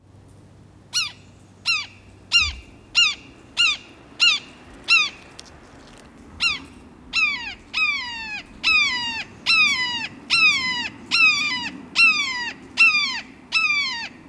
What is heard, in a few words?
Red Shouldered Hawk